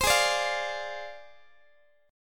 Ab 6th Flat 5th Ab, Guitar; x,x,15,18,18,16; strummed

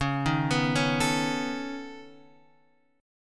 C sharp 7th Suspended 2nd Sharp 5th C sharp down

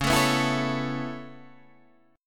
Db Minor 7th Sharp 5th Db down Guitar Drop D

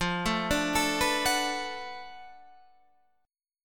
B Minor 7th F, chord; Bm7/F arpeggiated

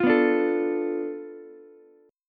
F 6th Flat 5th F Ukulele; strummed; chord; 10,11,10,12; JGuitar

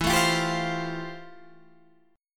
F Major Add 9th E; Fadd9/E strummed; JGuitar

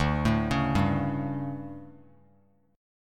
D Major 7th Suspended 4th, chord; DM7sus4; arpeggiated; JGuitar

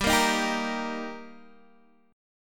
G Minor Sharp 5th G, Guitar, DADGAD

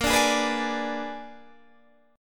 Bb 7th Flat 9th Bb down Guitar Open D

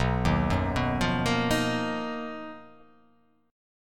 B Major Sharp 11th

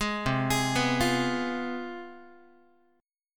C Major 7th Suspended 4th Sharp 5th, down, Guitar; CM7sus4#5 arpeggiated; 11,8,11,9,9,x; JGuitar

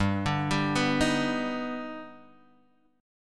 D sharp Augmented Major 7th G, chord

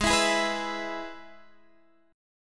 G sharp Minor Sharp 5th; strummed; x,11,14,13,12,12; G#m#5

